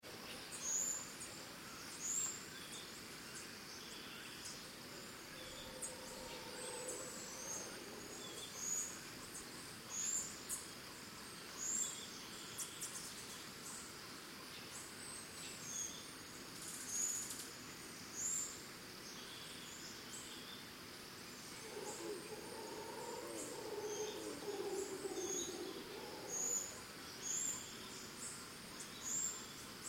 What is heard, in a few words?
Birds Crickets Costa Rica Distant Monkeys; Backgrounds; Forests and Jungles; Big Room Sound